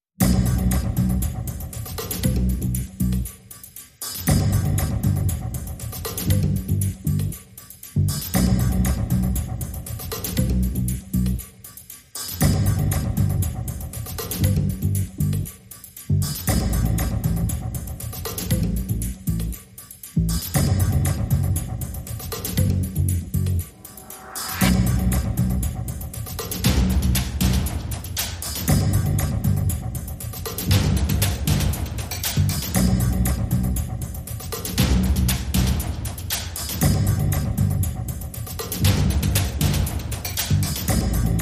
No Bass Grunge Bed Mix

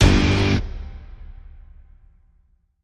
Stab Guitar Single (G)

